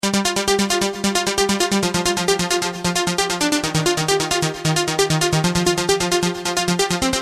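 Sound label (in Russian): energetic sms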